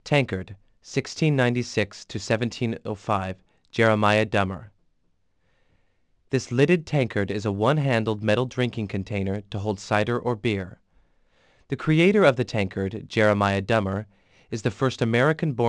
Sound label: drum